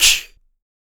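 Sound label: CHINA CRASH, MALE PERC; Spectrasonics; Vocal Planet; Jazz FX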